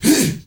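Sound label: MALE HEHA, SHOUT; Spectrasonics; Heart of Africa 1; CH. SHOUT2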